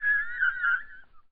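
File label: Special Photo Barcode Forest Environment Bird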